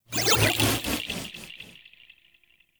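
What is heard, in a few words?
Power Shroom Projectile Whiz By; Warframe; Nokko; Empowering Mushroom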